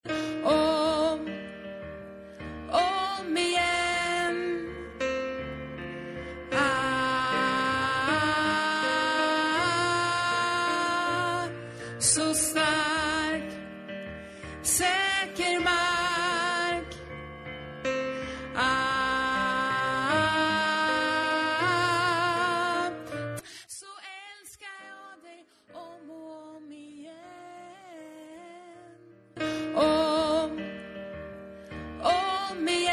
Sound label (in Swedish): bas